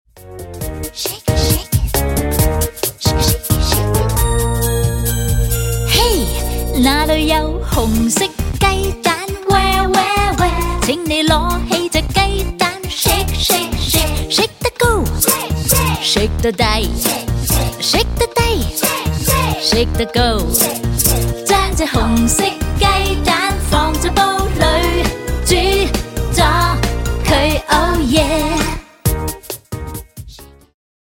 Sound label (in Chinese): 無對白, 正向心理兒歌